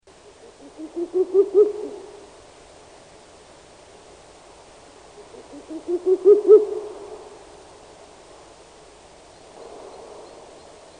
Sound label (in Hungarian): Urбli bagoly; Madarak